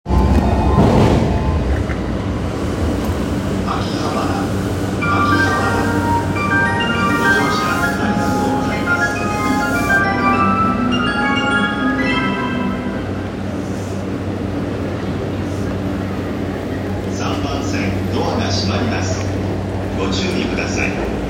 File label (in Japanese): JR 山手線 秋葉原 CW, train sounds